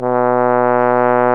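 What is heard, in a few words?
BRS Bass Trombone, Solo; Roland LCDP06 Brass Sections; Bs.Trombones; Bs.Bone Solo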